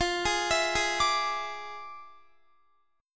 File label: F Minor Major 13th, F, Guitar; arpeggiated; JGuitar